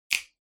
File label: Finger Snap Foley, Dry